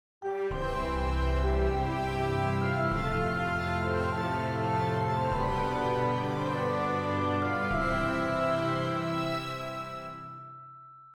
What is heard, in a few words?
Transition